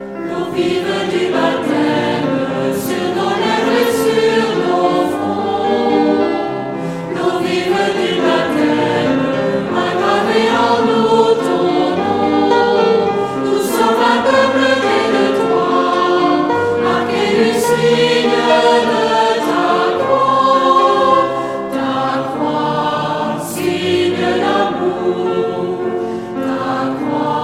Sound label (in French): L'eau vive du baptême refrain seul